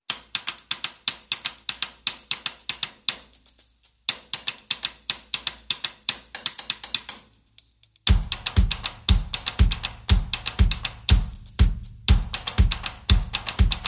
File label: Less Percussion No Claps